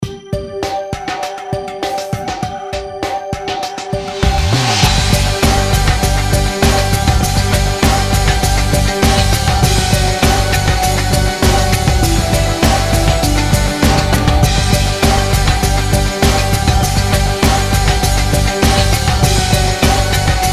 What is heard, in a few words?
Corp Finish Line Ahead